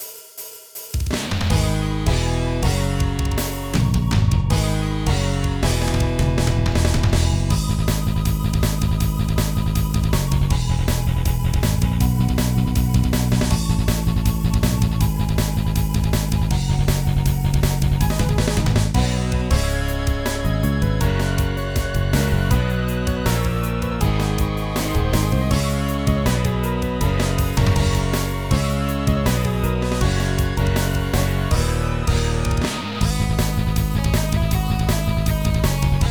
Rock Stage Karaoke; Pinky Street Kira Kira Music Hour Pop Princess; Rock Stage 1

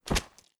Impact Mud Wet Heavy; adams venture origins

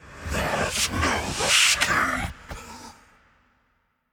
Hiding Spot; The Town; Easter Egg

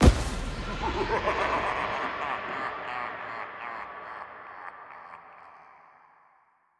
Pumpkin Vampire; The Inn

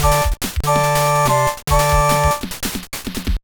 Jingle; Mr. Driller 2; gba

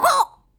Bird Collision

